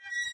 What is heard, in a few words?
shutter, ambient materials